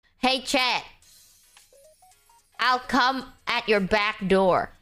backdoor

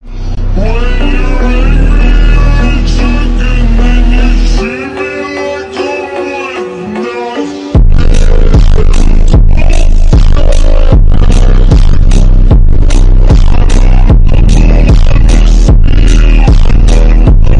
bass boosted; ohio sigma

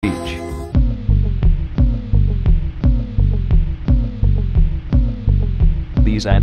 rhythm

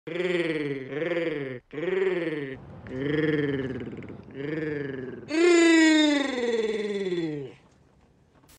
purr; dustins

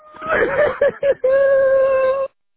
crying

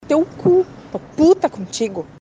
romantic

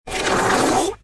fast fart sfx reversed

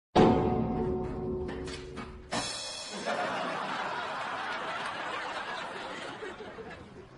laugh audience

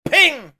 ping pool

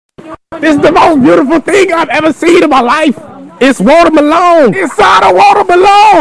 water

